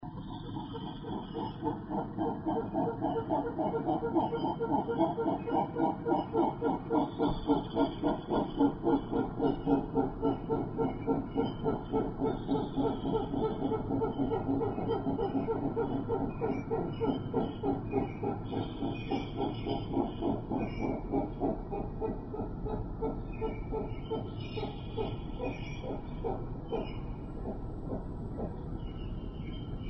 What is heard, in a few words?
SNOW food grunt